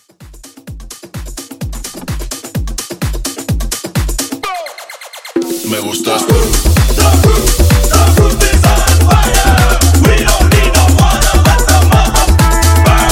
Hype Bootleg

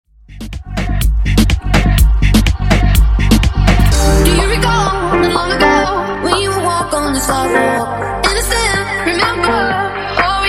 Moombah House Clean 124 Bpm

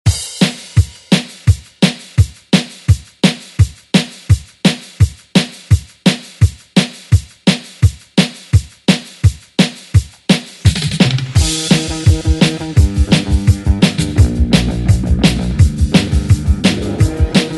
80s redrum; DJ Mhark

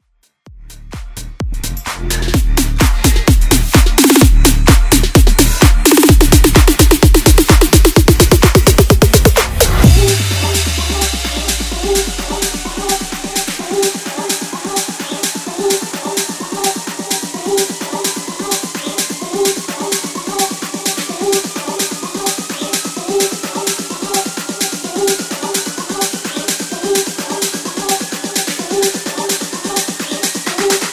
bassline mash up

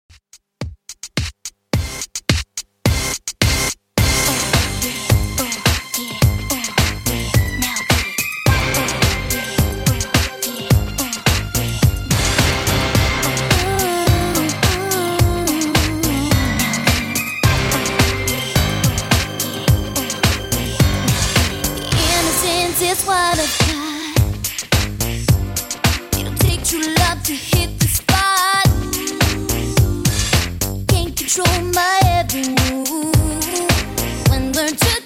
Redrum 107Bpm

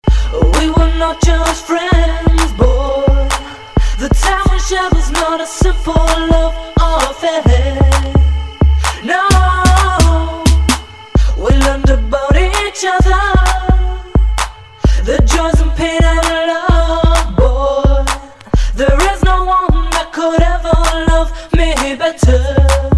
Classic Party MashUp

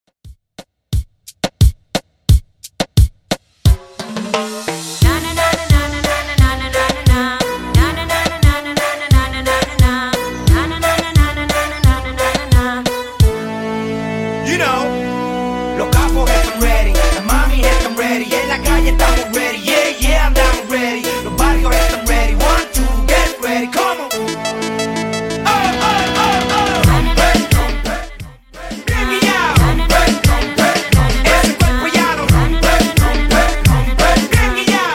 88bpm; Xtend

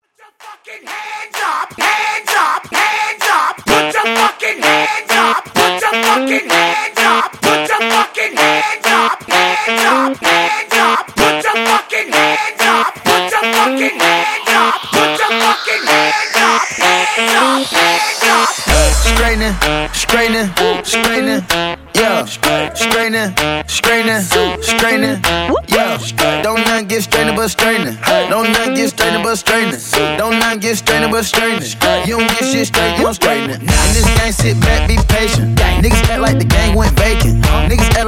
Clappela Intro Breakz, 128 Bpm

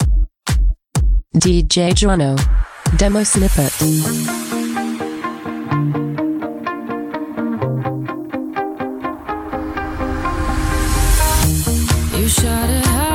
ReDrum 126bpm Cm

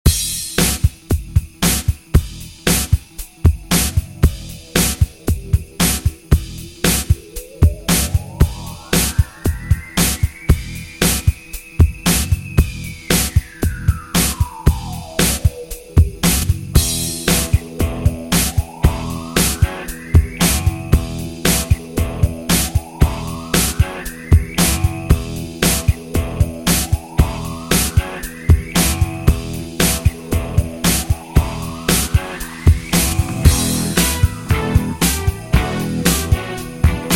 80s Dj Intro Outro 115Bpm

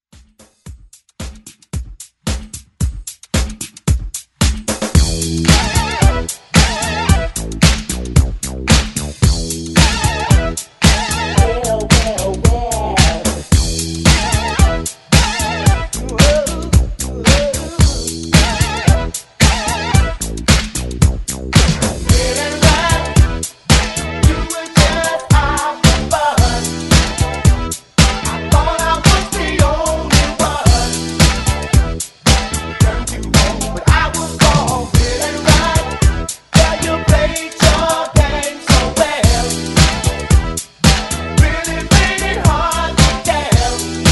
80s Disco Funk Redrum

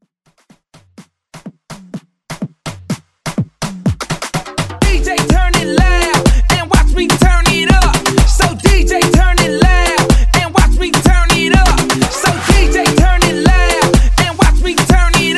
Afro Hype

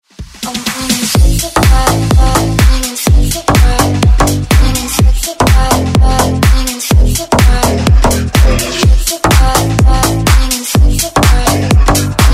Pop Dance Redrum

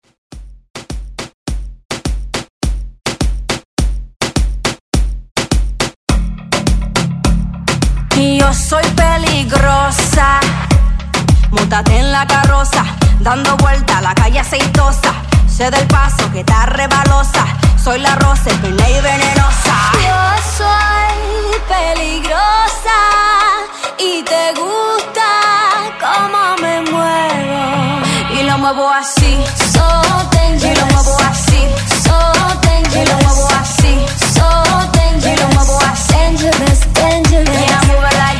ReDrum 104Bpm

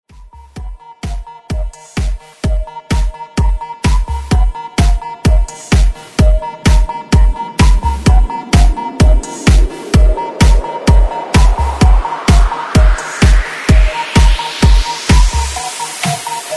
BREAKMIX

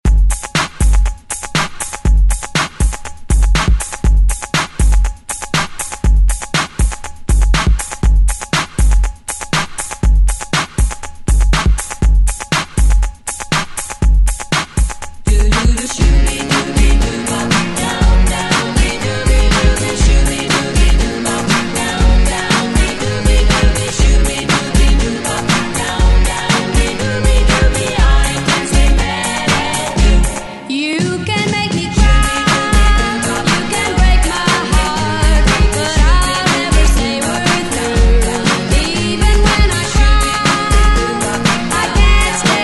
Re Drum 120 Bpm; DJDOLLS

